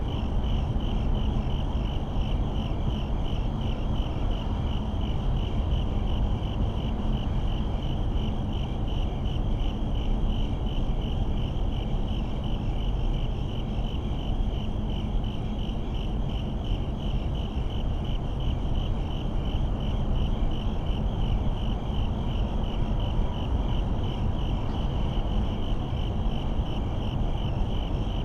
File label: Suburban night crickets traffic roar; urban and suburban; boom library